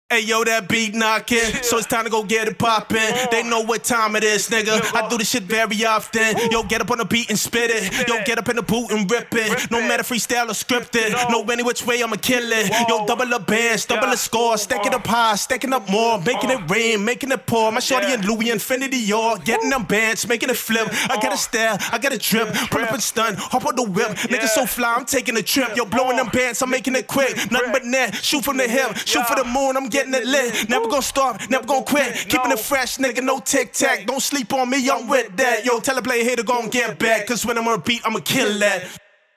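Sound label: Freestyle hip hop 150 B flat minor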